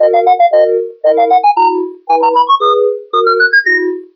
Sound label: flutey loop; Loops; Electronic